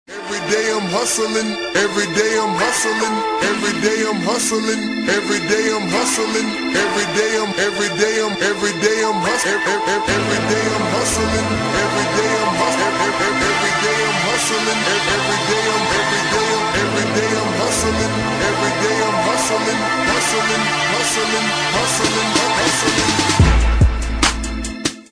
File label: instrumental with hook